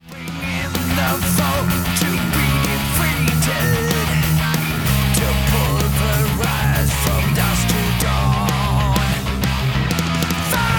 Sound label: firepower